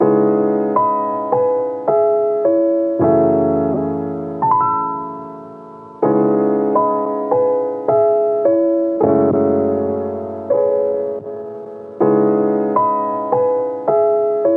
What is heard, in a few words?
Piano Dry - 80 BPM C# Min; Melodics; Cymatics - Eternity Sample Pack